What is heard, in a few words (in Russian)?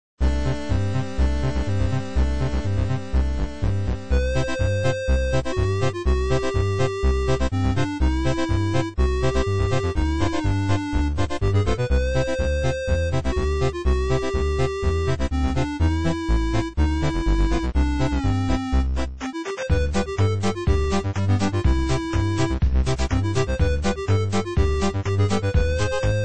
(8bit)